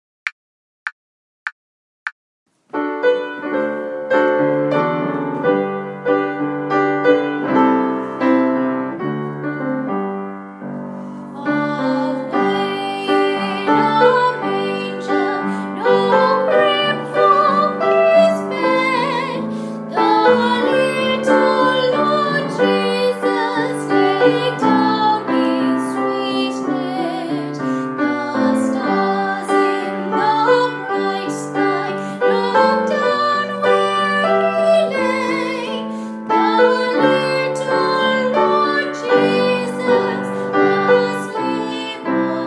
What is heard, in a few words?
Away in a Manger vocal guide; carols backing track